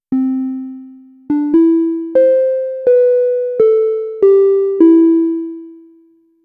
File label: descending tone